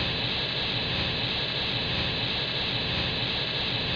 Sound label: skate